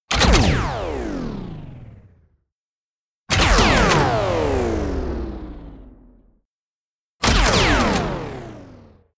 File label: turn on off